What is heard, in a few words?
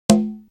Perc; AMG; Perc One Shots